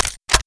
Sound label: missile load